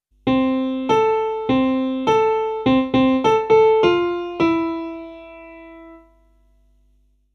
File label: dont laugh music